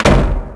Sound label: Door Wood Medium Closed; Max Payne